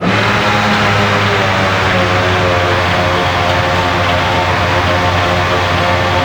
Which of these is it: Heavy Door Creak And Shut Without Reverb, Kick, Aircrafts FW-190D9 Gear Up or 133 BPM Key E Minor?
Aircrafts FW-190D9 Gear Up